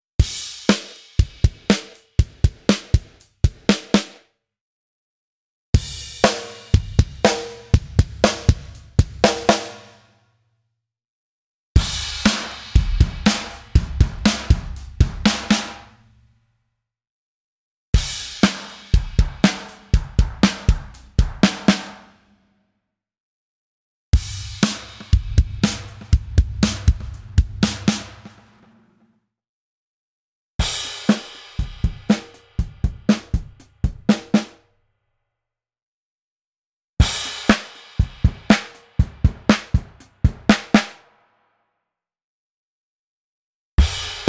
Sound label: BFD Player Drum Kits